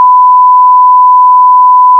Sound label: 1V 1KHZ